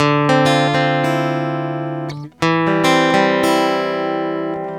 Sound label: GUITAR SAMBA